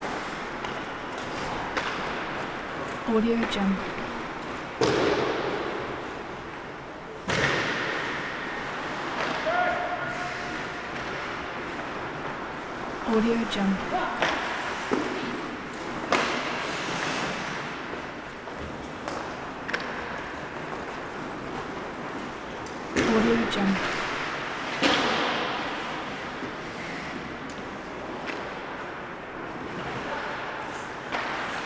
hockey game adults skating shooting male voices

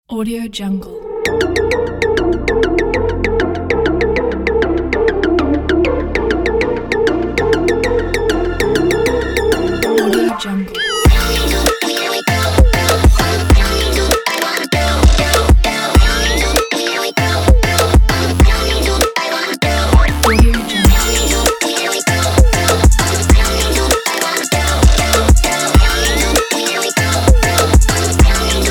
hyper pop

